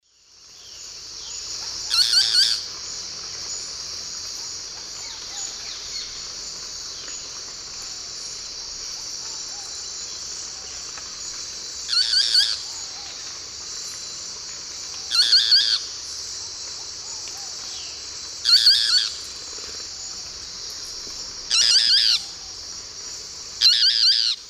Megarynchus pitangua, song; S. America; Eastern Lowlands

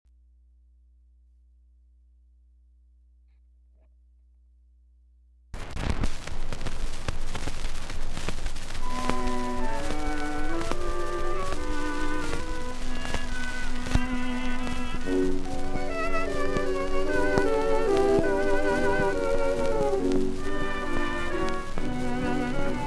Orchestra And Vocal Trio; Betty Boop; Popular Jazz Archive 1920-1936

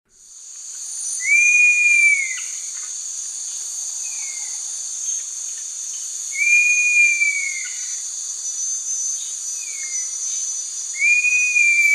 Porphyrolaema porphyrolaema, song male; S. America; Eastern Lowlands

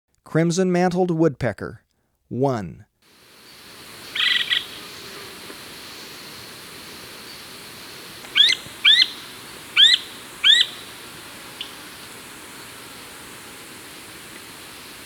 Crimson Mantled Woodpecker, Birds of Ecuador; S. America

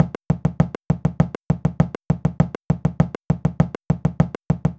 JUNKYARD RHYTHMS

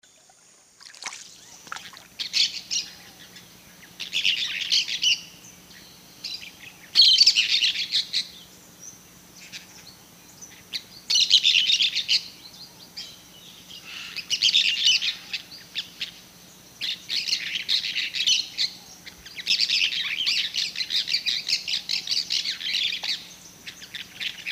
Brotogeris cyanoptera, calls; S. America; Eastern Lowlands

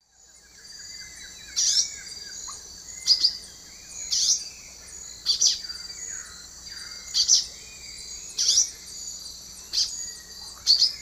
Ramphocelus carbo, slow song; S. America; Eastern Lowlands

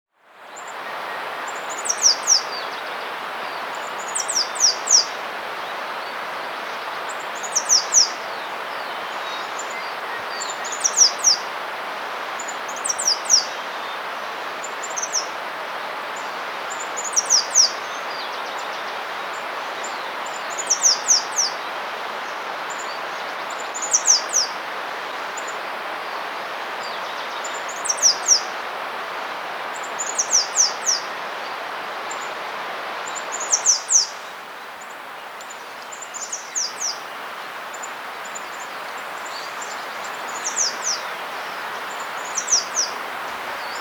Capped Conebill; S. America

